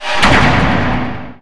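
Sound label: door close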